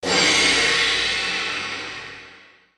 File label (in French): gong